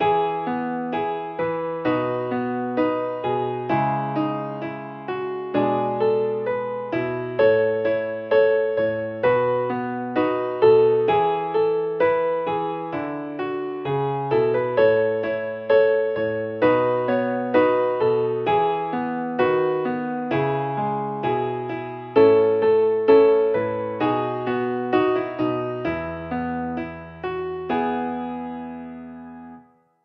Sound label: accomp; hymnary